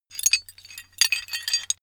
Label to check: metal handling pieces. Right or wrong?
Right